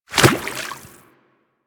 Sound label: Infested Small Walk Water, Footsteps; Warframe